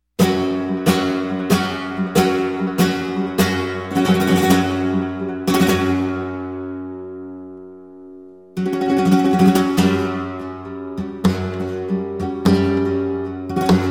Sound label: Soleares, spanish guitar